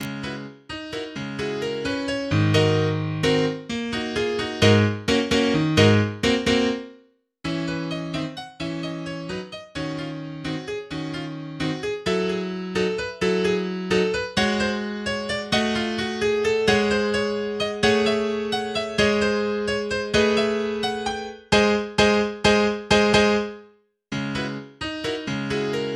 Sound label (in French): exercise pour piano; Petit exercise pour piano No 6